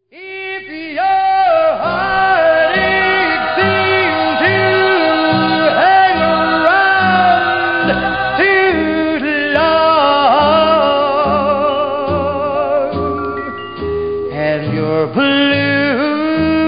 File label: Cry